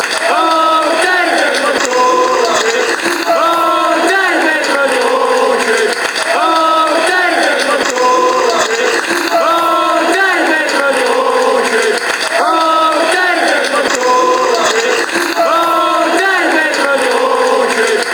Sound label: oooh, fanchants; david mcgoldrick; Ipswich football song